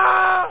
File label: aaaaah!; conquest